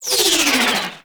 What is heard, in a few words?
Ship Dual Cannon Moa Tail; Warframe; Weapons; Corpus